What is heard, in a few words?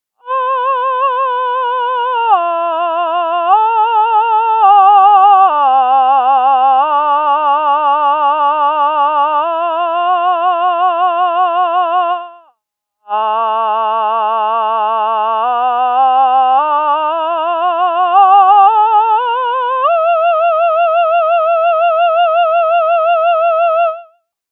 with Vibrato